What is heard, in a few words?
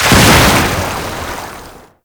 Water Tomb Explosion; Warframe; Yareli